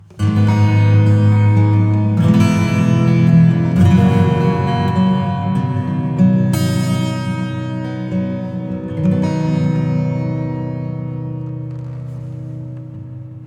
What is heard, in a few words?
Reverb Acoustic; bx rooMS